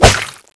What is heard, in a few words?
knife hit, katana